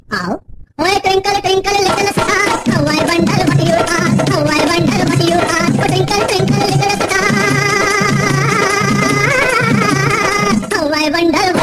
Twinkle Twinkle Little Star, Mix Of The Indian Dhol, Famous Nursery Rhymes; Ringtones